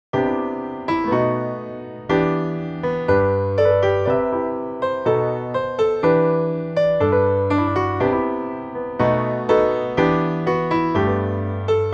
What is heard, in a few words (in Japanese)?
Session Player Piano, 生演奏さながらのピアノ伴奏; Logic Pro 11; Keyboard Player; Keyboard Playerの初期状態; コードを自動で演奏する; 初期設定の音色